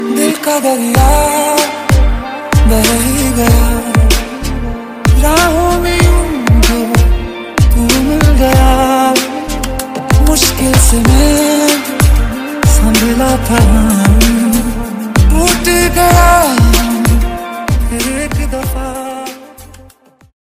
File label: remix bollywood ringtone